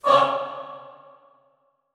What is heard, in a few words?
"fah", classical choir, C#3; Best Service; Extended Classical Choir